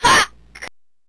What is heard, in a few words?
grunt